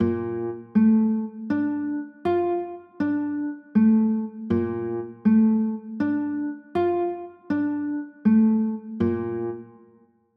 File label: b flat maj7